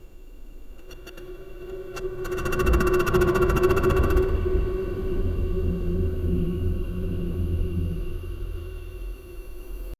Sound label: scary ghostly scratching; supernatural